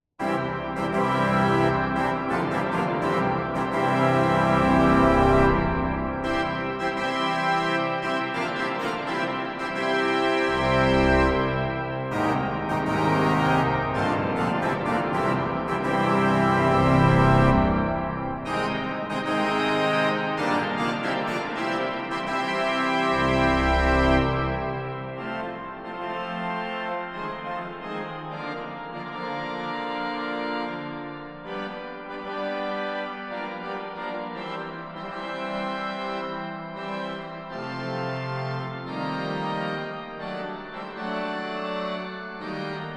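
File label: easy fanfare, organist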